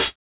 studio hi hat g sharp, Royality free pedal hi-hat tuned to the G# note. Loudest frequency: 8520H